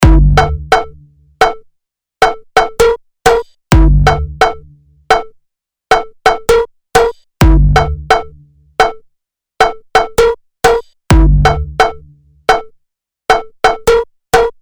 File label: beat